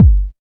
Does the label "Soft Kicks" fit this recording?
Yes